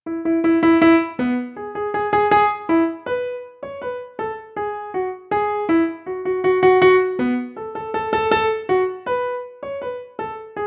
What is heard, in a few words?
Ditty